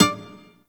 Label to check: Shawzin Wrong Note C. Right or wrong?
Right